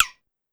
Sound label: Conga Slap Down Fast, Pitch Env; Clavia DDrum-AT; Pitch Env Various